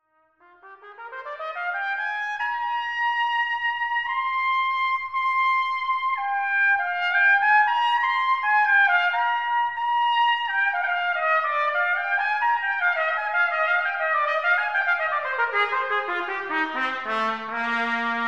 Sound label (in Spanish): trompeta